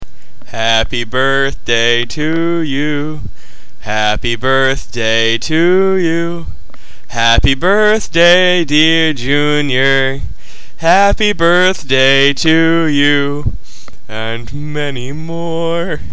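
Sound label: Birthday to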